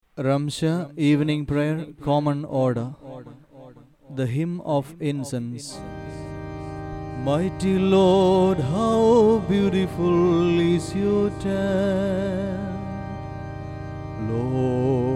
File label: Hymn of Incense; Ramsha, Common Order; liturgy of hours; Common Part